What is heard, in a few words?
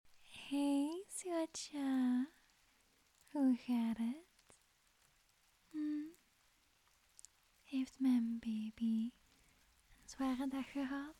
rain; flemish audio